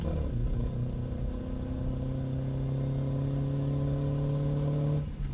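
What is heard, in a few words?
engine high loop; Blind Drive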